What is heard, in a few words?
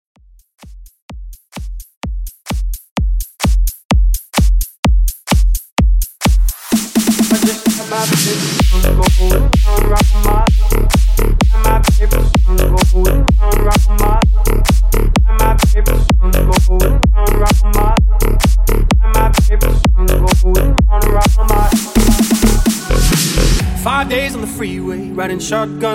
Bounce Mix; Top40